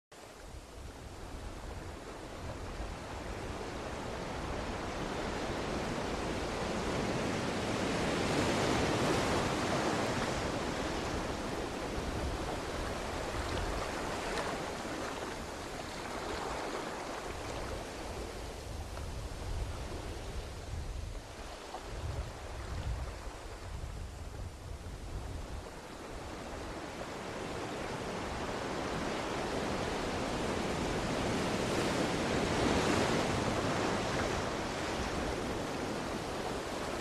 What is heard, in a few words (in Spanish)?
Sonido de las Olas del Mar